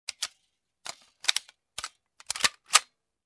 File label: Reloading pistol sound